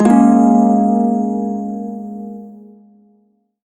Prime Shawzin Yo Chord, Music; Warframe

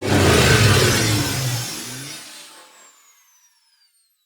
Ship Boost Engine Stop; Vehicles; RailJack Ship

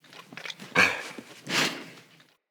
Wolf Cub Standing Idle Grunt, Animals; Vocals; Orengal Wolf Cub

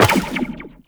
Step Zipline; Footsteps; Walk; Warframe; Characters; Tenno

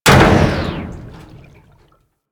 Cloning Tube Stop; Warframe; Grineer Ocean; Ocean Sabotage DNA

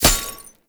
Medic Drone Hit Notification; Abilities; Warframe; Tau; Dax Medic

